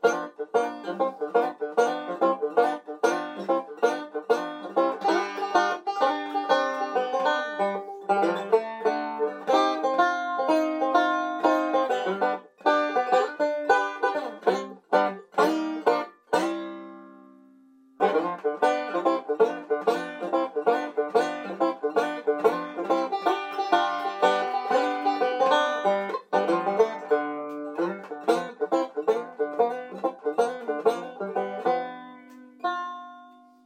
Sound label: theme banjo version; strike it lucky